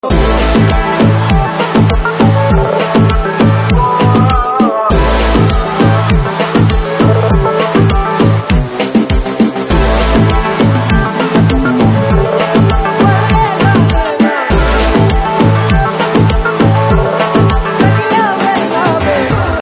BGM Telugu Ringtone; Krack

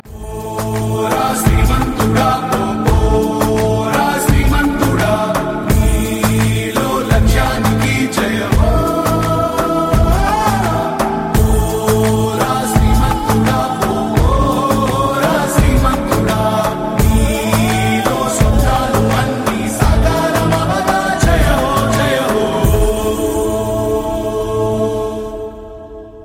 Theme Telugu Ringtone; Srimanthuda

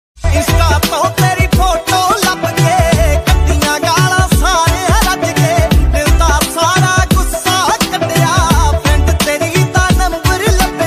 Desi Punjabi Ringtone

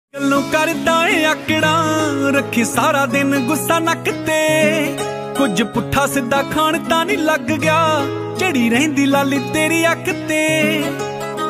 Dance Punjabi Ringtone

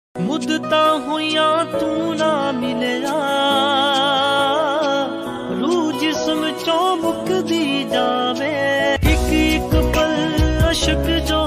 Punjabi Tune Ringtone

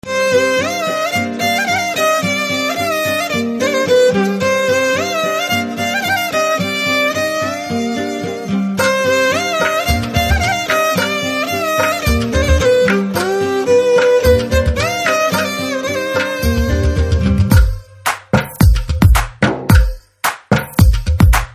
BGM Telugu Ringtone

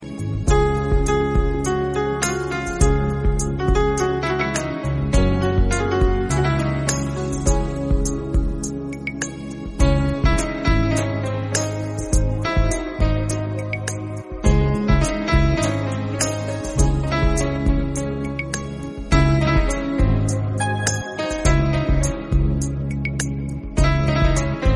BGM Telugu Ringtone

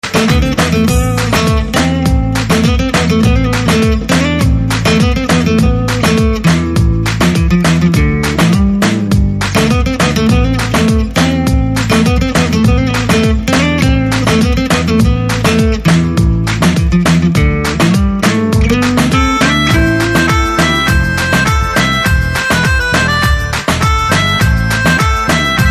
BG Tone Telugu Ringtone

